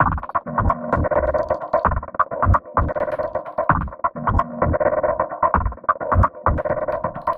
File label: drums